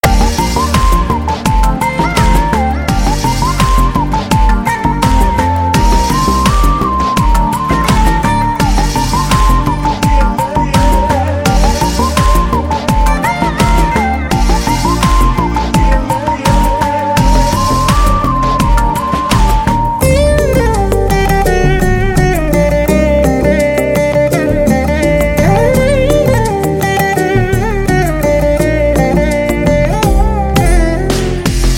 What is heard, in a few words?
a late night walk with veena; tamil; seema raja